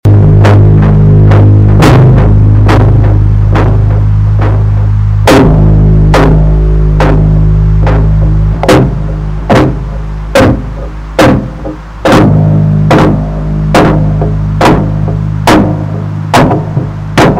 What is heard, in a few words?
Piano Theme